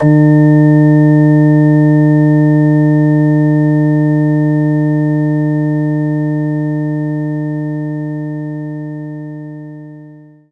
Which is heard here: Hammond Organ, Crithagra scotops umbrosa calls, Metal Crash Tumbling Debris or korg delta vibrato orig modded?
Hammond Organ